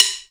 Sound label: STIX VERB